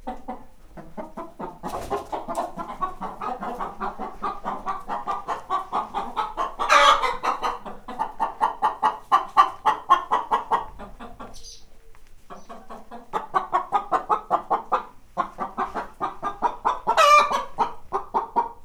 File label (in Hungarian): kotkodálnak reppenés, magyar tyúk; parasztudvar